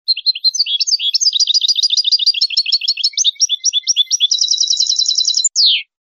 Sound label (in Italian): Cardellino passata, canto